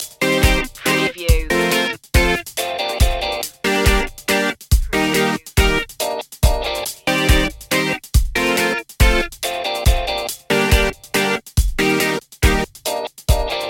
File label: full city loop